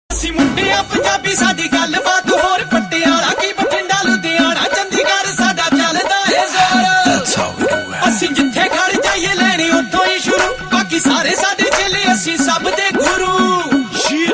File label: Punjabi